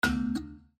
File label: critical stop